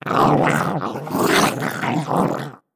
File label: headcrab elevator growl; headcrab classic, scripted; creatures